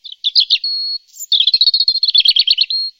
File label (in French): rouge gorge familier